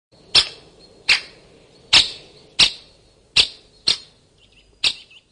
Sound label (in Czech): dendrocopos major kontaktni hlas; strakapoudi